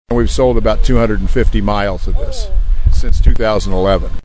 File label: buzz; waid trap bag